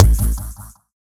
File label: Hit Impact; FREE SFX PACK VOL 1